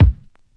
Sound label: old school low kick single shot F key; kick drum samples